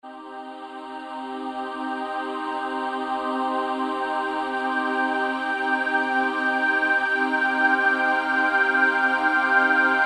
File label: Oper Ambient, Roland SR-JV80-06 Dance